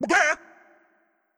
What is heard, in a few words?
Chicken